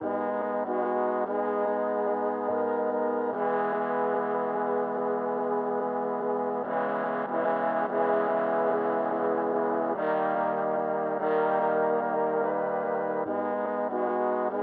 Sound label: 145 BPM G# Min Brass, melody loop stem; Loop Stems; Cymatics; 2022 Melody Collection; March Madness